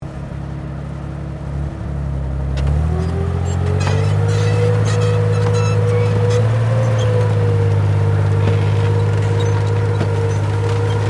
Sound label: TANK CENTURION CRUSHING CAR DISTANT, British Centurian main battle tank; Trucks; Vehicles